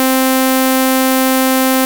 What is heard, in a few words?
supersaw